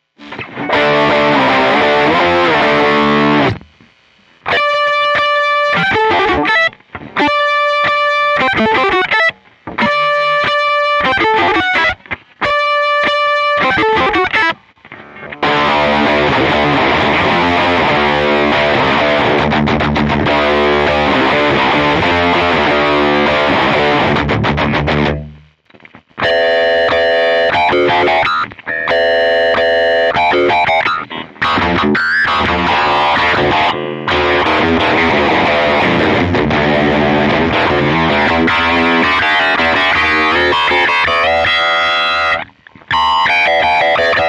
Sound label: Ring Mod test; Ultra